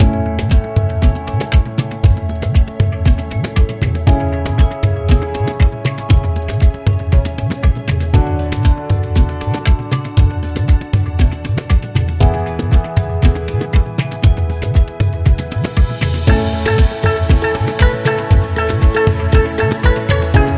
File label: India Inspiring; sounds of the world